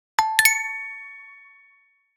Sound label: Glass, Alerts; Nokia; Windows Phone apps